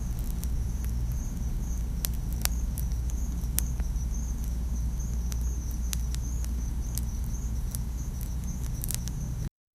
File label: baby monkey sound of